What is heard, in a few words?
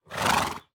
Animal Horse Snort; animals; AdobeCC